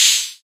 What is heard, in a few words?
soft sounding open hi hat g sharp key